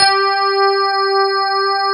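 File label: JV electric piano; Pro-Rec - Synthsonic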